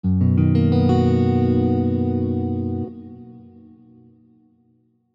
B major 7

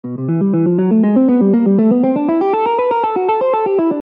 approach

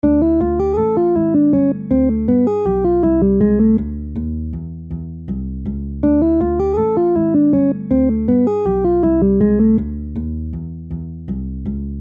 major 2 5 1

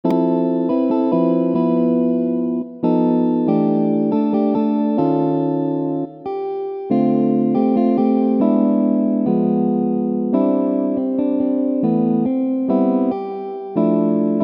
chord

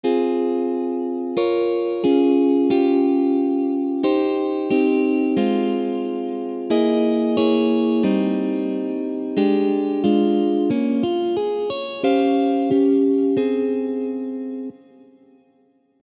small chord

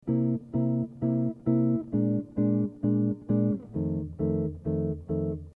blues

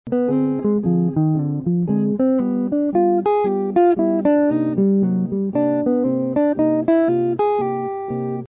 minor 2 5 1

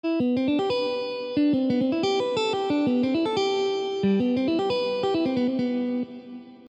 C Major Phrase

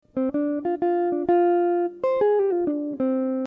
blues

